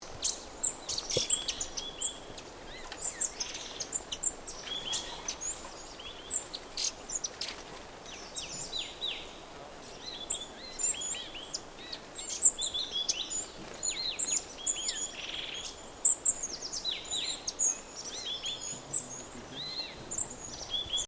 stripe throated yuhina, bird sounds calls

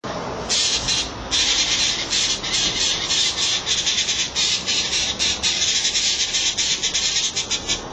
san blas jay

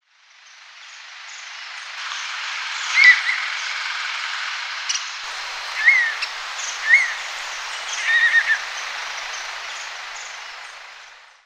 sulawesi serpent eagle, bird sounds calls

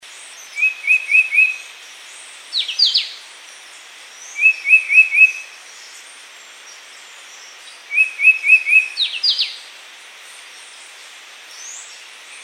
yellow browed shrike vireo

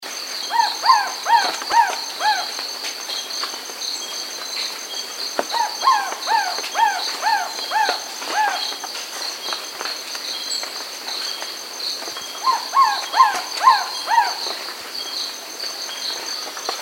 white-eyed trogon, bird sounds calls

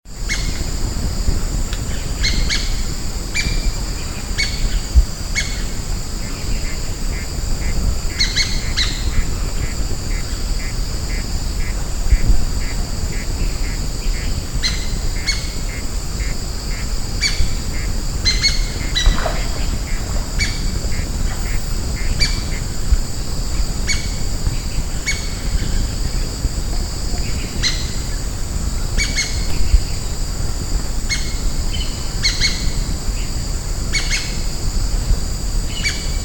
rose fronted parakeet

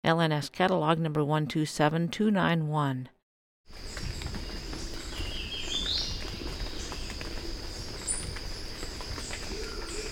slaty breasted tinamou

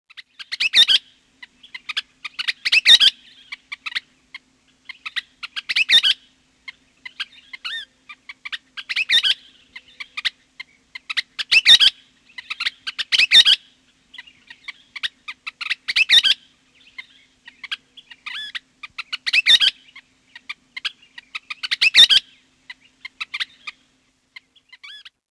western kingbird

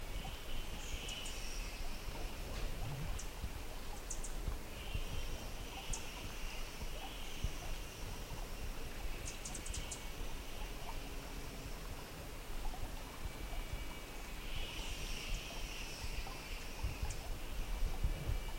speckled tanager

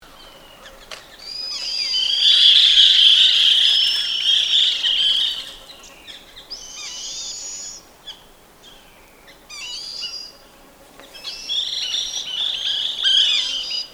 white throated laughingthrush, bird sounds calls

